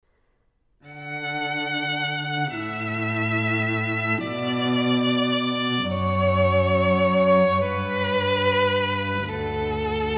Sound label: canon trio; pachelbel